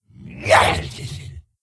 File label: Attack; General Vezax